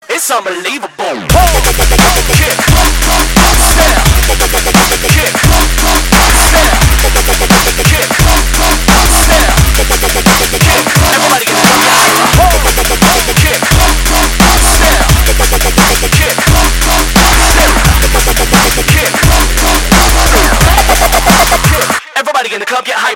Kick Snare Drumstep

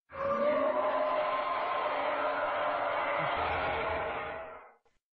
Hollow Cry; Bleach

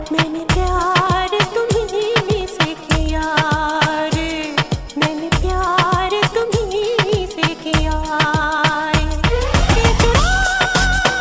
Hip H

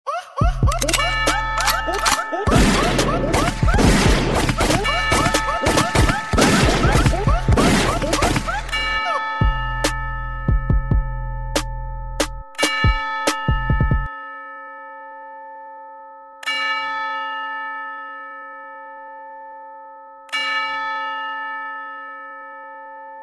TRAP

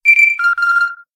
Holocall; Swtor

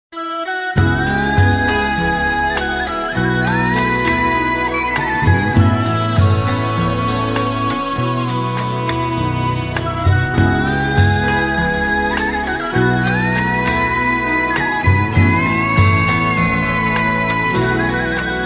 Turkish

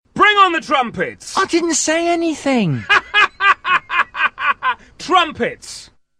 Trumpet

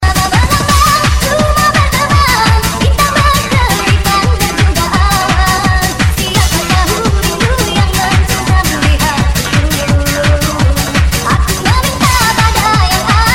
Melayu

